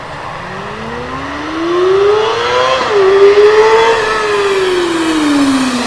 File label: Yamaha R6, motoskanas